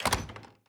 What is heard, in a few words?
village door; evo